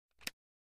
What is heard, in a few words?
Card Flip Soft; casino games card games